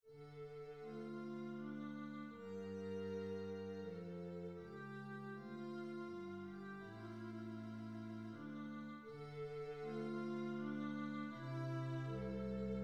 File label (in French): sarabande mineur